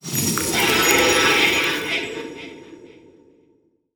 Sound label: crystal, lithoids; lithoid portrait; Stellaris